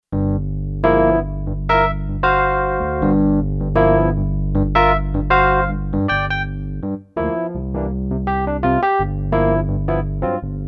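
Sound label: Suitcase E Piano, Kurzweil K2500X; Factory Programs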